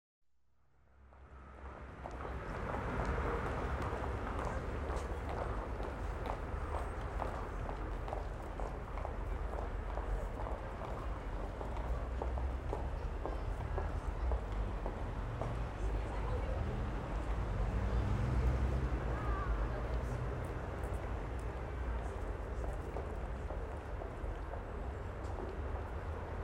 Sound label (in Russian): Peshehody